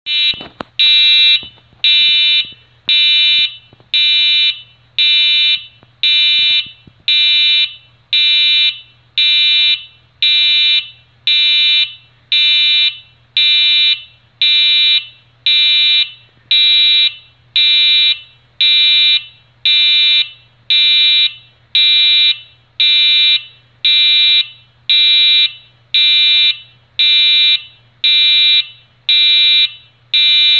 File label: HIGH INT PIEZO